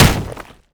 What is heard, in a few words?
punch stone